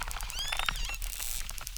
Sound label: Chirp zap, acquarium